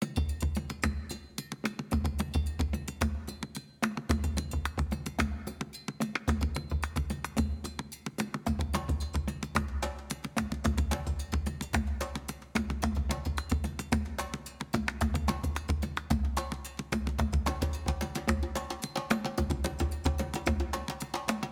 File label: Forest Percussion Intro; gamerip; shu; ps vita ps4 switch windows